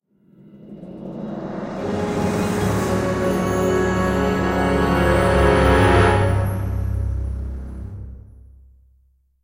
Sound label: Caught Stinger